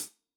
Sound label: hihat closed, gretsch kit